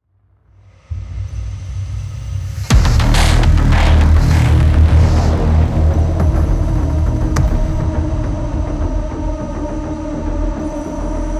PVP Main Ambient; Doom Eternal; PS4; Stadia; Xbox Series X/S

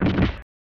Hit; Bishoujo Senshi Sailor Moon; SNES

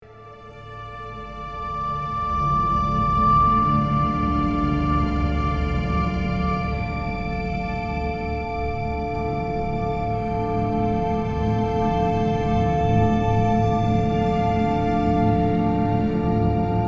Tense Loop

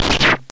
powerful energy gun

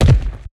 Death Body; Shadow Minion3

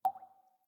Cave Random Drips; The Inn

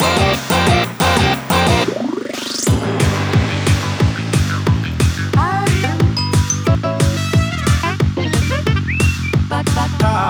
Circus (Battle Beat); Splatoon 2; Octo Expansion; Tentacular Circus

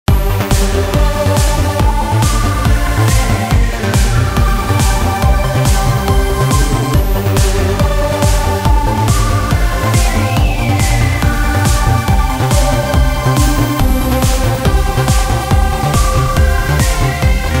Dance Music

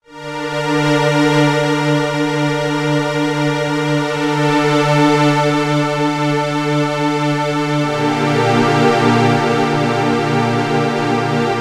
Dark Pads; Vampire Legends Power of Three

